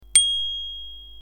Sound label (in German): Fahrradklingel Flexibel